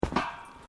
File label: Footsteps Boots Grill Metal Step